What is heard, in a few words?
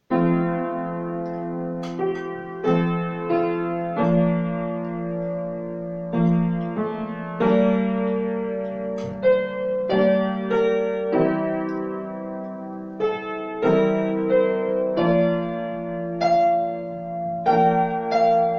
DREAMY MEDITATION